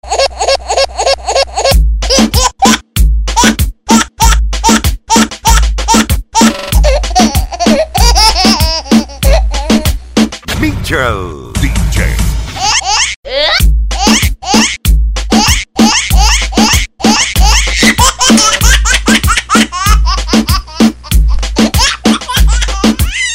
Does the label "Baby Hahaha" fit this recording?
Yes